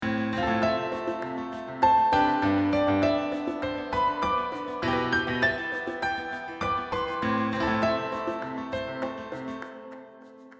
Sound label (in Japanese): 生音 piano